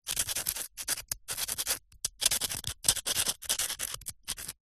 office brick pen ball point trying to write on

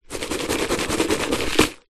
foley dirt in plastic pot shake

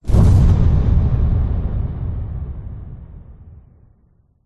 explosions gas boom airy designed; zapsplat

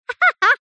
child boy 6 years laugh short; zapsplat

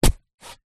metal shovel spade single dig grass soil

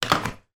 household shower head place back into holder

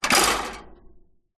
impacts metal wire mesh pull release hit

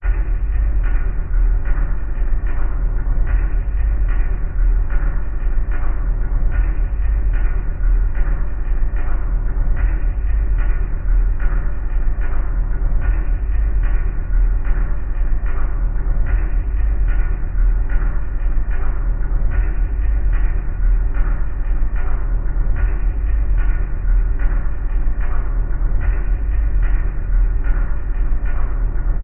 sound design drone dark; sound effects one

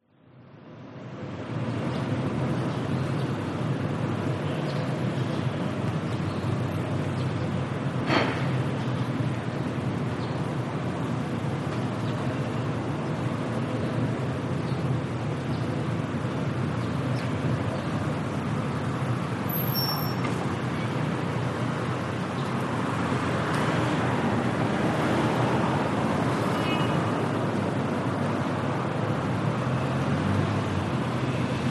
New York City Queens Neighborhood Atmos

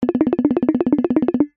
cartoon running fast footsteps percussive; zapsplat

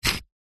stone small single scrape on rock or brick; foley

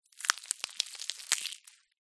horror gore body part break snap

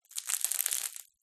crusty bread cut tear

